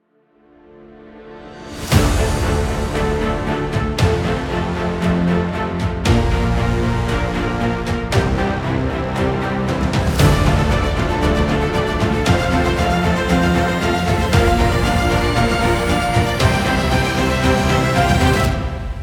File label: Mode Start Theme; Ambiences; cstrike